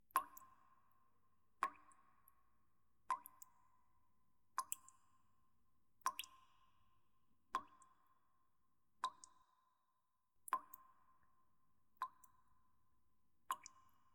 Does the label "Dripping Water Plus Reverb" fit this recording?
Yes